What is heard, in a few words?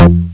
dump bass